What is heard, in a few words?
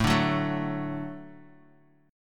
Gb Minor Sharp 5th A; Gbm#5/A strummed